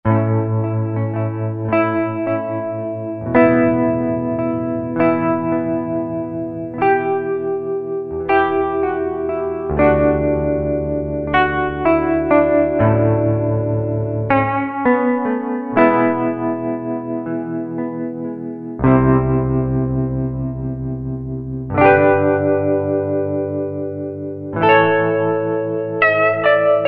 Peaks Gtr, Roland SR-JV80-17 Country Collection

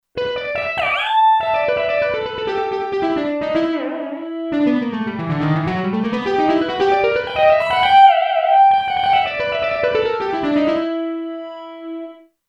Smooth Lead, Kurzweil K2500X; Factory Programs

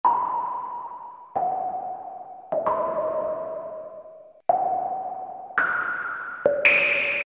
Caverns, Roland Juno-106; Factory Preset

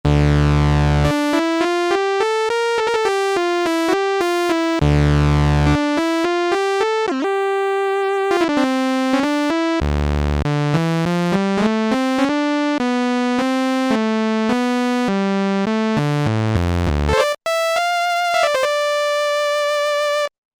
Free Space, Korg MSC-1S; Korg MSC-1S Variety

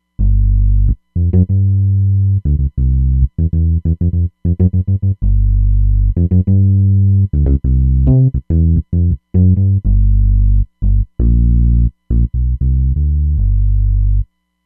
All Round Bs, Roland Fantom XR

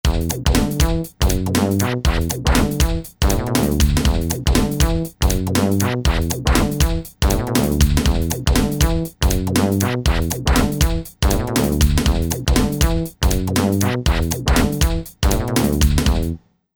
Rap Hip Hop

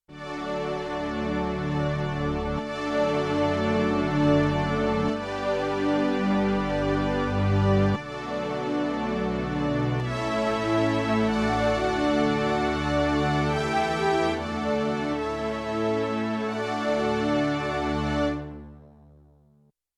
Strings, Yamaha TX16W; Combo Performance 2

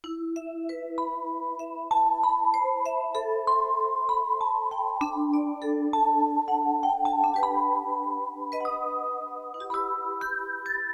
Synth-Vibe, Yamaha TX16W

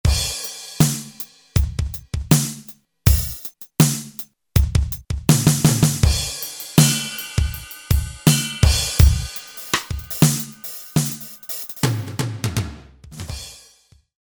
KIT LA Fat Kit; Roland L-CDX-01 Rhythm Section Instruments

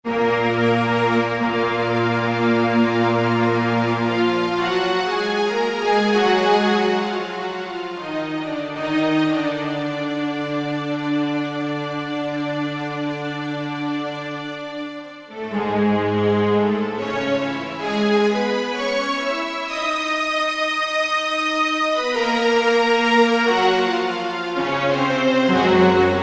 Drama Strings

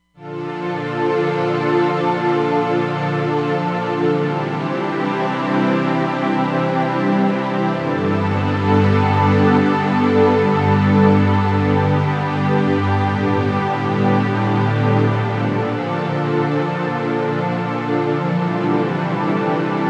FS Syn Str, Roland Fantom XR

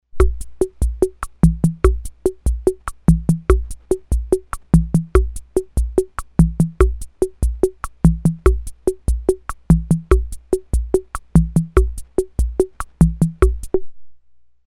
CHA-CHA, Ace Tone Rhythm Ace FR-2L

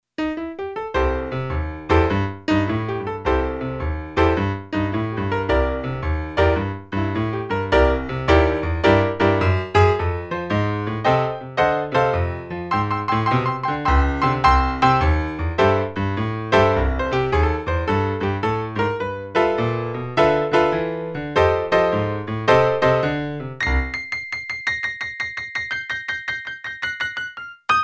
Grand Piano

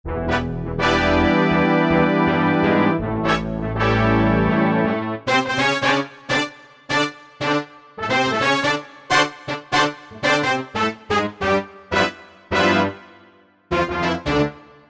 Full Brass, Korg MSC-9S Organ

